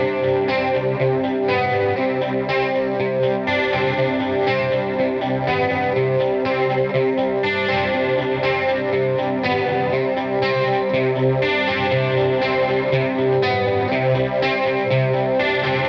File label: Guit; Multitrack